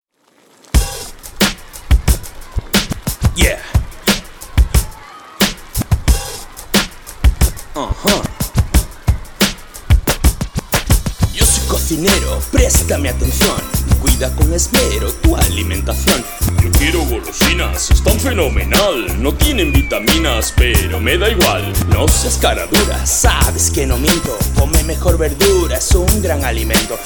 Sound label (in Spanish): rap del cocinero; vivir en salud; salud bienestar